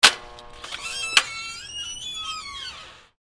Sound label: door open; Golden Crayon Sounds